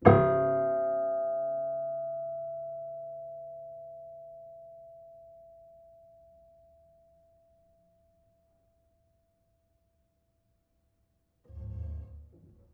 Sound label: Piano Harmonics, Harm Low Mid